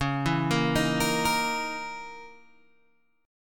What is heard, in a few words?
Db Minor 6th Add 9th Db down Guitar Open G